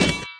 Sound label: Quick Button Click